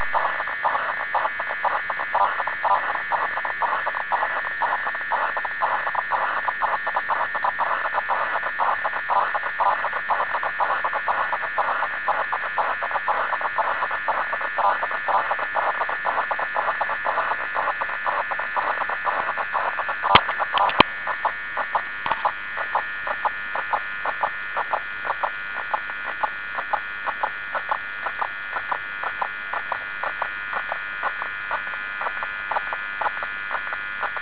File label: FAX sound